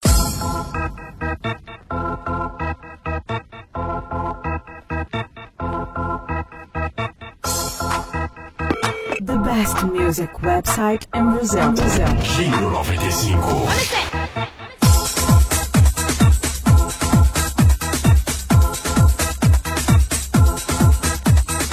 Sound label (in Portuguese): Territorio Tribal